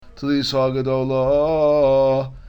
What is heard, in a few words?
telisha gedolah, cantilation